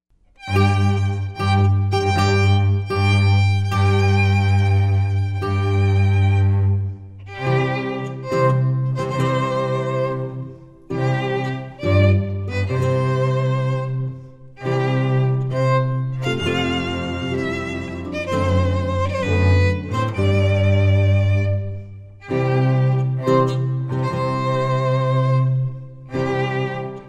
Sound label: Bridal Chorus, Strings Trio